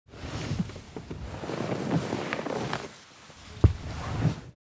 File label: Helicopter foley interior perspective boarding the helicopter